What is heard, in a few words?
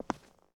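Foot Conc Shoes Walk, footsteps on concrete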